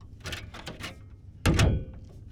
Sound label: Sedan Back Door Open Close; Sony Pictures Sound Effects Series; Period Vehicles